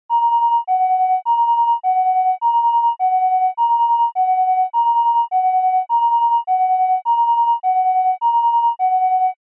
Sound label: Ambulance Siren (Dry)